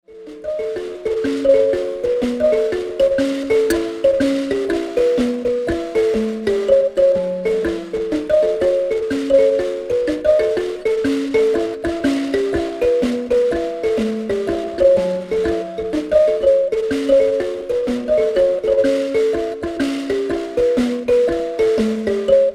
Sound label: mbira